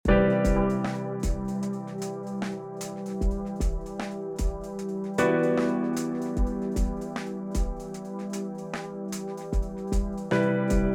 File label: smooth loop